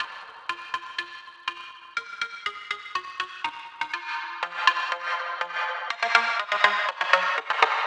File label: Music Groove 122 Bpm F